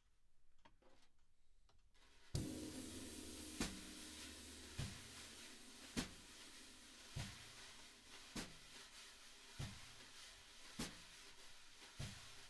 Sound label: Drums